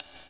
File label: Ride; Equipped Music; Loungin House